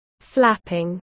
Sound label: flapping